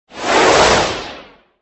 Flight Sound; Extra Lives; Android

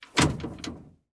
vehicle gearshift; teardown ps5 windows xbox series xs gamerip 2022

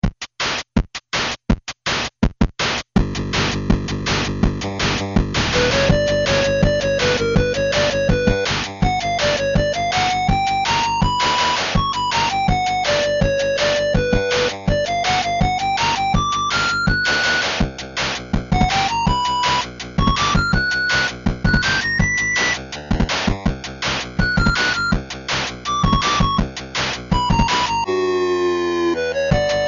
Egypt Flute; bill teds excellent adventure

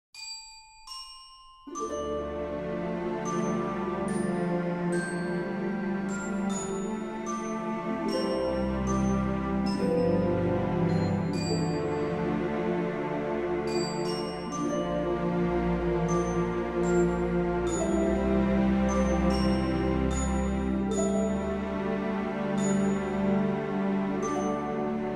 Solo Musicbox; Mx Cue Birth Solo Musicbox

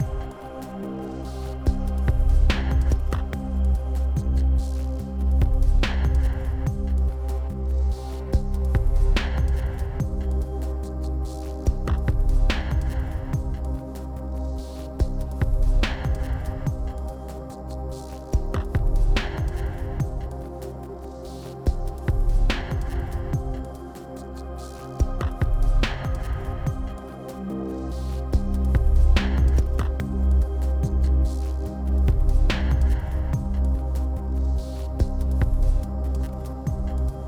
Exploration, 72BPM

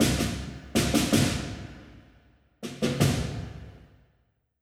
Quest Start DRUMS SMALL; Gedonia; Windows gamerip 2020

